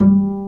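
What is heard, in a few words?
String, contrabass pizzicato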